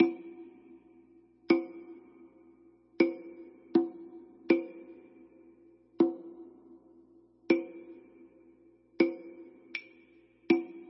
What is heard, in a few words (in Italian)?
la nota la3 ritmi